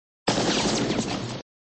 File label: Sound Machinegun sound, flipper; Machinegun sound v2; Indy